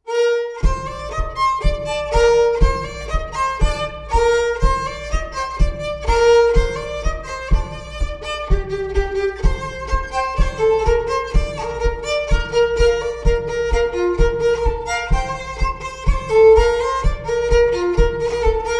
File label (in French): Bourree bordelaise; Une jeune fillette